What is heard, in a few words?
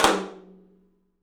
OPEN TOM; 3D Sound; 3D Drums